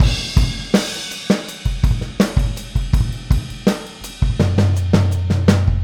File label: Boogaloo Fill Full Mix, 82 BPM; Sonic Foundry; Drums from the Big Room The Mixes